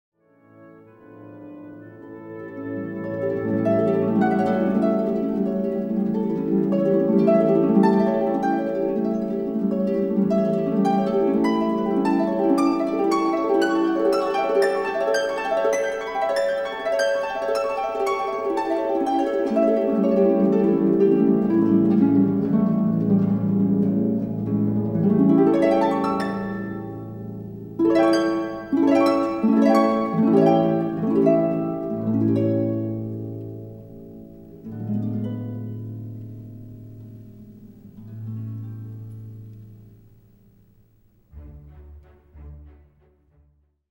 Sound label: Harp; Tchaikovsky Waltz of the